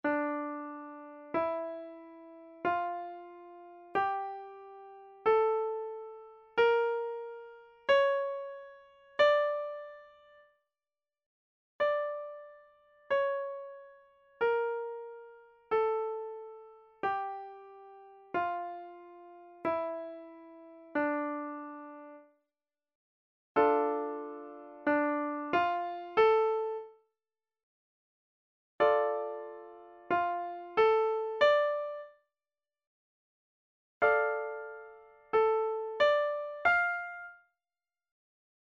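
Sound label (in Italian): Esercizio sulla scala di RE minore armonica, pianoforte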